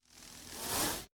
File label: DarkBeam Shoot